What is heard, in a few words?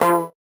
VocoBit Noise, 8-bit; musicradar; 8-bit bonanza